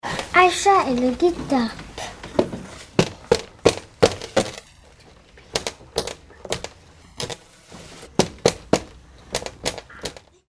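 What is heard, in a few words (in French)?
guitare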